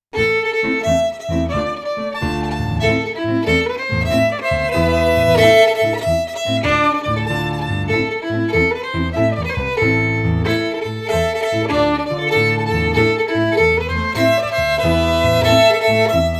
FIDDLE PIANO; Jink & Diddle Book o' Tunes 2021

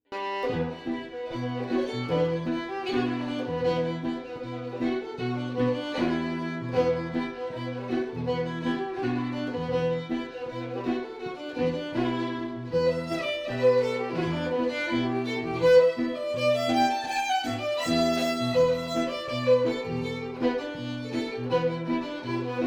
FIDDLE PIANO; Jink & Diddle Book o' Tunes 2021

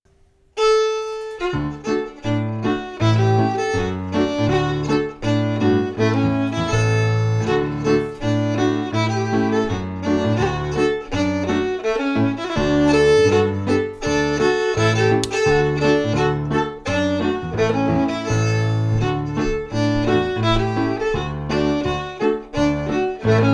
FIDDLE PIANO

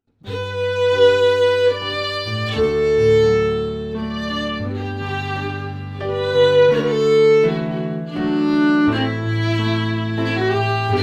FIDDLE PIANO; Jink & Diddle Book o' Tunes 2021

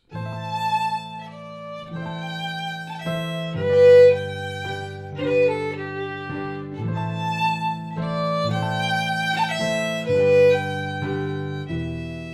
FIDDLE PIANO; Jink & Diddle Book o' Tunes 2021

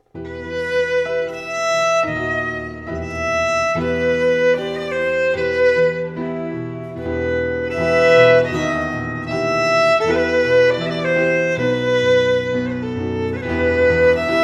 FIDDLE PIANO

